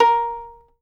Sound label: fiddle pizz A#4 R; Sampleheads; Whole Lotta Country 1 & 2